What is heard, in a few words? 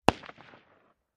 Mini 14 Machine; Rugar